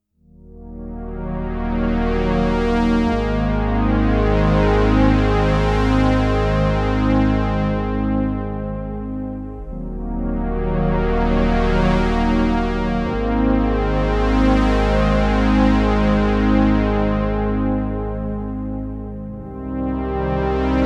Juno-60 Massive Pad

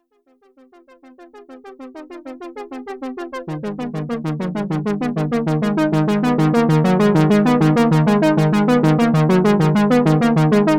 Arturia MatrixBrute

